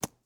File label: arrow dirt, attack impact